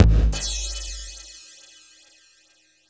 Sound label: TECHNO FX 140